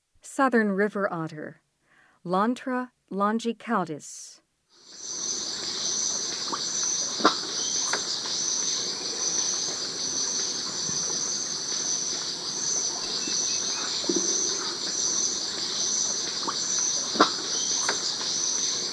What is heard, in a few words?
Souther River Otter; S. America